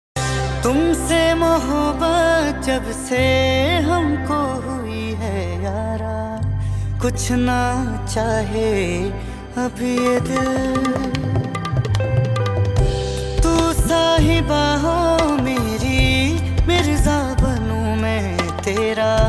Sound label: Hindi Version